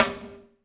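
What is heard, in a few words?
snare drum, Drums; Bob Clearmountain Drums I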